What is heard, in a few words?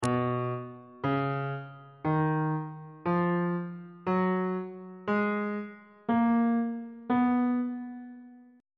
c flat lydian mode note positions on bass clef